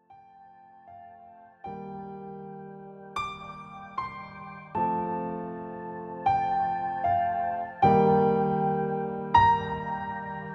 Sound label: CALM